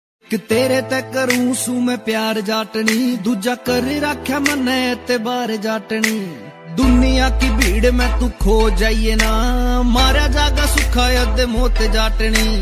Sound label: Haryanvi Song Ringtone